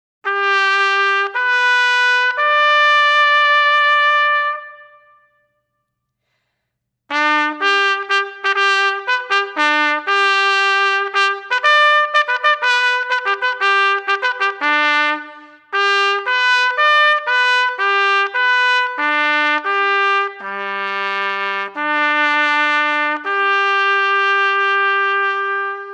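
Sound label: Call to Quarters, bugle call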